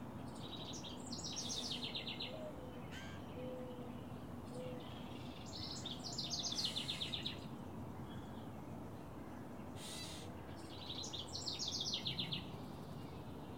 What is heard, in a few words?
Later song with catbird in background